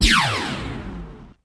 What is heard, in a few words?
Synth Energy Zap Sof